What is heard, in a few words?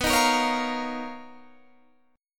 B Major Sharp 11th, Guitar, Half Step Down